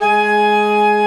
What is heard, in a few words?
FF A#2, pipe organs; Greytsounds VOL-1